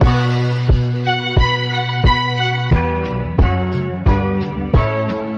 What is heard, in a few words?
horns, Phonky loops